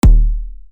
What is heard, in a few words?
Kick D; Syba; Syba sample pack